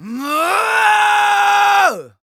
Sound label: Yells, Viking Vocal